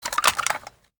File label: wpn gbl plr inspect settle; viper